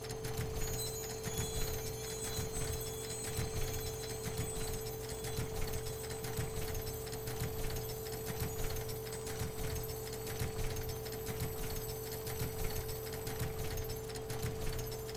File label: snowglobe quiet